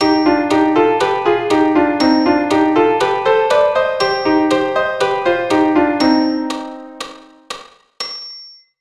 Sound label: Cm melodic